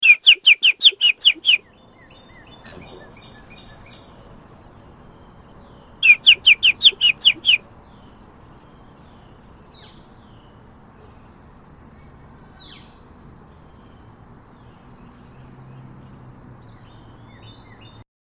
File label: Birds